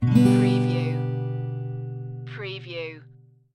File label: Guitar Chord